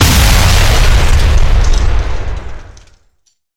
explosion boom; soundscrate

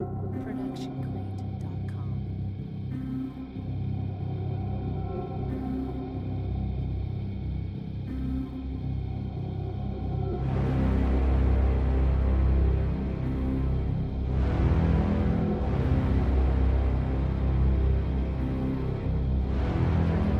Surge; FootageCrate

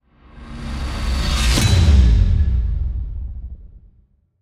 Bass Drop; SoundsCrate

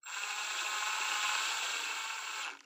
drill regular medium; SoundsCrate

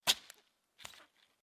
Stab And Pull Out

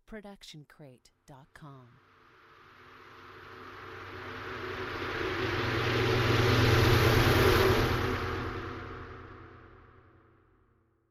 distortion wave reverse reverb tail; SoundsCrate

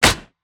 monster chomp; soundscrate

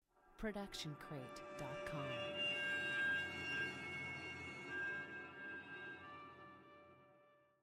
Cello Airy Scratch Harmonics; SoundsCrate

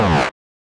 stylized glitch sound; soundscrate

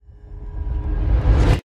horror scary rise; soundscrate

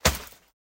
Action Hero Land; SoundsCrate

